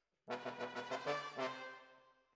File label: Trombones, jazz for piano